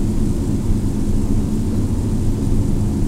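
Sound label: avro lancaster cockpit left, engines; War Thunder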